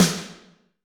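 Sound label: Snr Brighter, snare; Alesis D4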